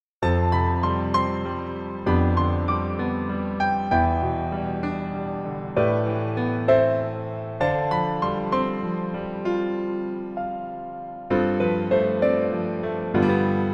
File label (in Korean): Piano ver 멜로디MR